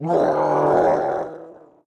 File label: Cry; Peace, Death